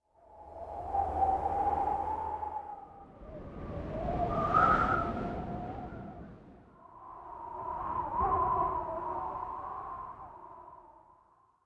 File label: whistling wind